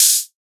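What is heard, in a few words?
open hi-hat, Drums; HiHats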